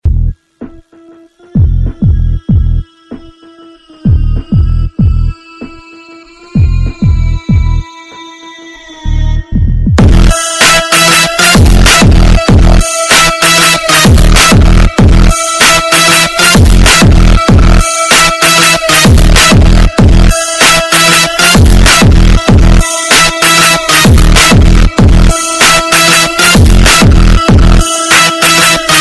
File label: Hard R N B